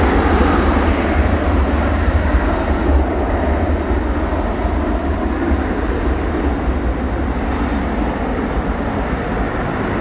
airplane taking off

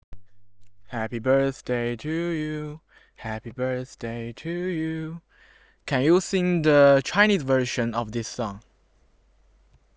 sing

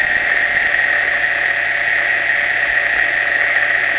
fault, anomaly detection on sound data

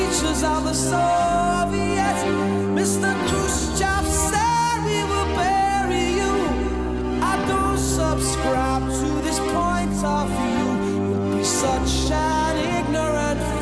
rock, music genre

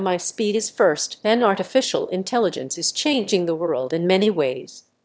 Babble

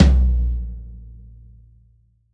tom low mid

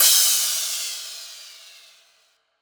Crash; Cymatics - Odyssey Crash 2

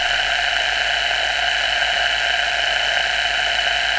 fault, anomaly detection on sound data

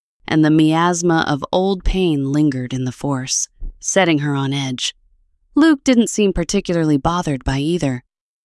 Yell; Mara-Jade-StyleTTS2; 11labs

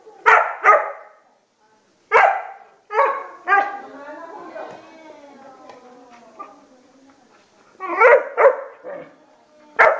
dog barking

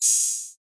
hihat open

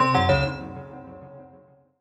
fail chime

